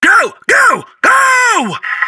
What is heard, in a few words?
attack